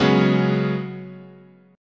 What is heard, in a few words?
Db 7th Suspended 4th, chord